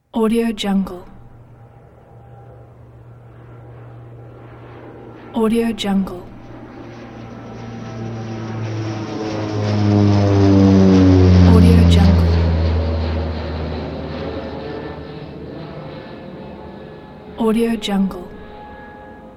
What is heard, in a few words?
turbo propeller plane passes overhead